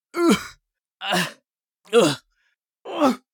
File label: agony; Escape from Tarkov